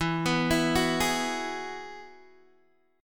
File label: E Major Add 9th E, Guitar, DADGAD